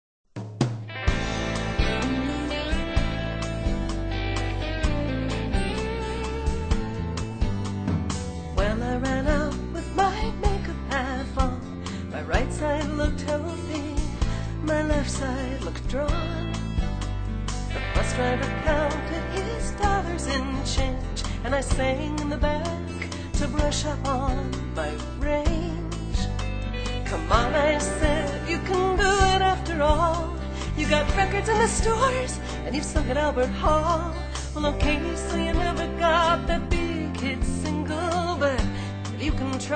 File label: jingle